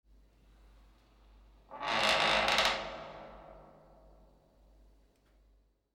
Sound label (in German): knarrende tuere; geraeusche digital